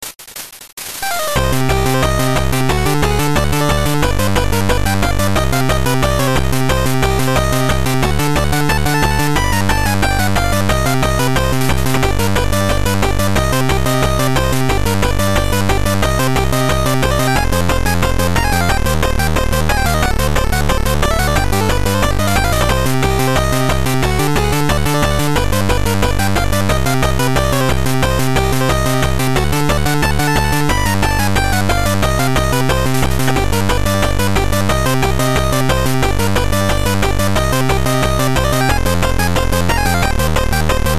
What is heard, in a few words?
Round 7; Bomberman GB